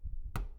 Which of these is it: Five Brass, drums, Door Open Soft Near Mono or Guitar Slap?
Door Open Soft Near Mono